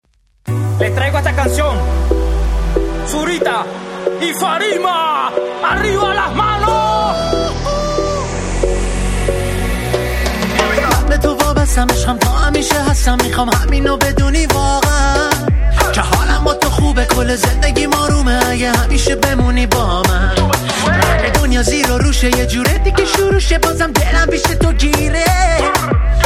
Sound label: reggaetor